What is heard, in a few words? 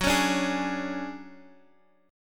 D-Major Sharp 11th, Gb